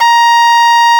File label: Arco Cello D4